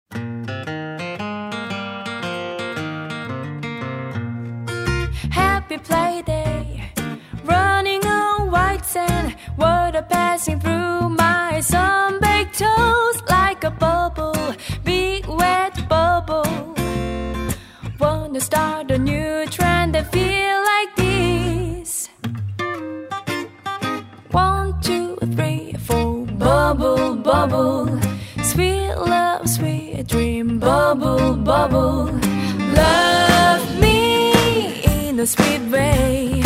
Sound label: HAPPY BUBBLE